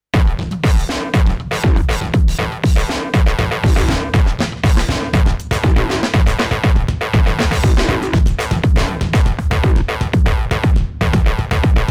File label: korg esx; made in 5 minutes; weeklybeats 2012